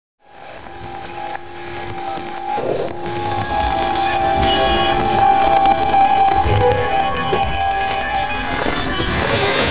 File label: urban morning chaos, car horns